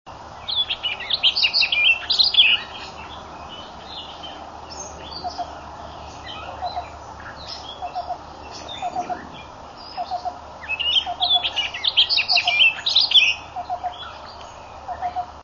oriole orchard with cuckoo; birdsongs

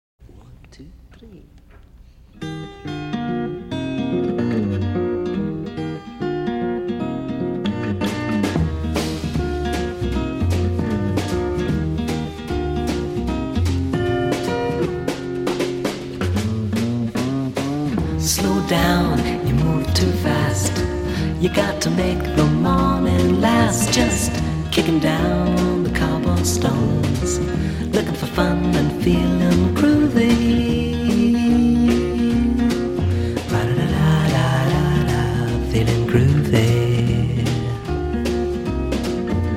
groovy

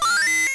Throw; Super Mario 2

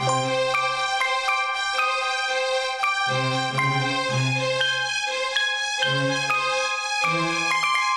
Synth Recording

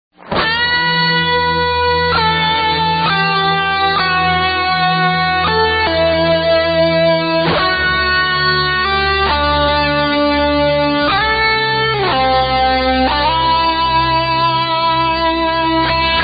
Guitar Instrumental

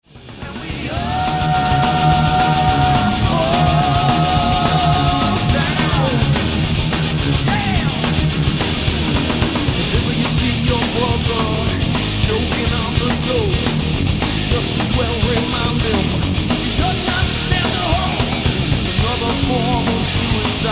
scream

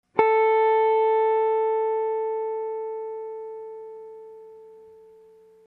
guitar note

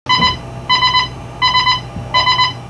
carrier; combates

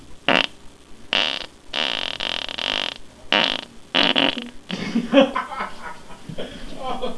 wet, farts

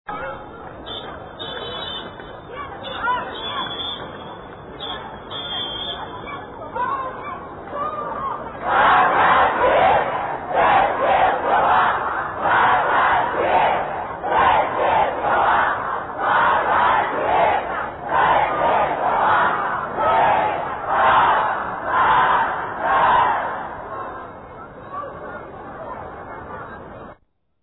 Marching Students; WuZhong

